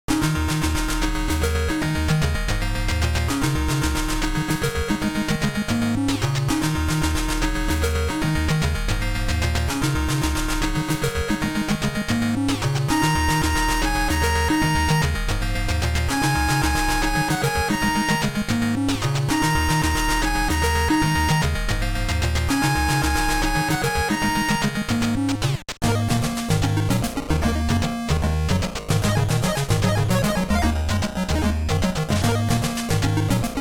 N163 Swing Rhythm, Another short chiptune using the N163 chip, made in famitracker.